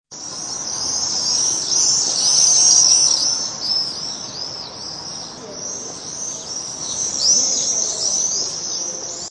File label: swift, birds life; kukucka